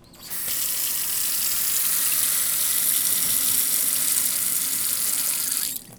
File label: Foley